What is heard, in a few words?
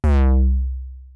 Retro Laser